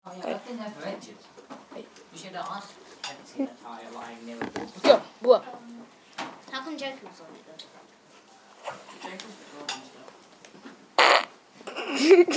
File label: fart